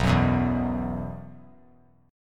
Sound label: B Suspended 4th; Bsus4 strummed; JGuitar